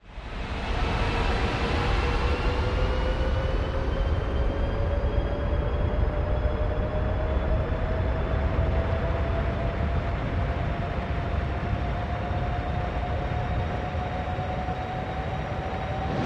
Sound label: Rocket Takeoff Land, take-off/land